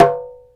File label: DJEMBE, ETHNIC PERC; Instruments & Percussions; InVision Interactive; Lightware VOL-1